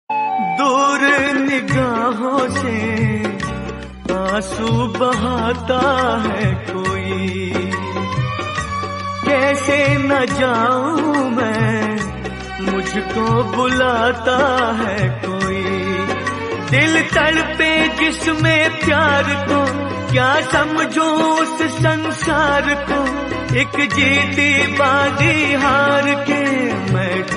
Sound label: old song ringtone old is gold ringtone hindi old song ringtone